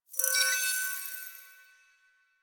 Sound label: Choir Chorus End; Warframe